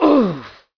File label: Wounded